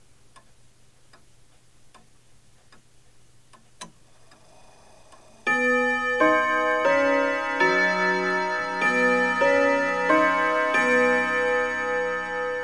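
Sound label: bell ringing soft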